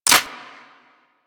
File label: Rifle Charged Mech; Warframe; Infested; Quanta